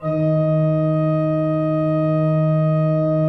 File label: D2, Cathedral Organ; Propeller Island; ITAL.PRINZIP